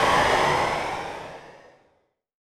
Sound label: engine shut down fast